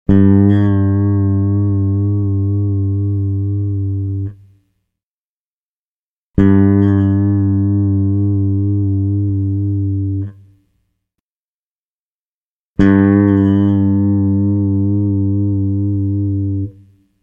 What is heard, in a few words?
Rock Bass